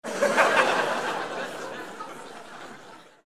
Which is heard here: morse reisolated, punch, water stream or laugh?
laugh